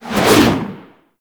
Generic Spin Whoosh; Warframe; Characters

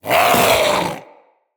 Vocal Attack Short, Hunting Critters; Characters; Vulpaphyla

